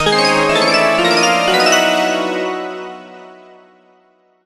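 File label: Jingle, Rare Monster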